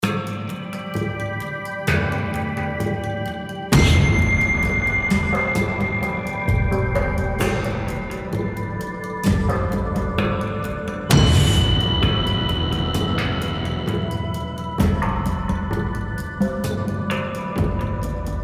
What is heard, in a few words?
Action loop